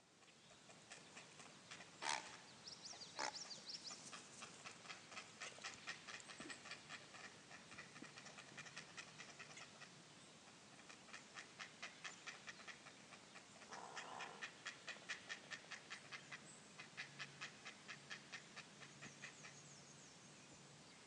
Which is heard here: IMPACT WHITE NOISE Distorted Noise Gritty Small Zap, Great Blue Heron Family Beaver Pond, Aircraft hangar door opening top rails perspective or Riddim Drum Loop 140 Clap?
Great Blue Heron Family Beaver Pond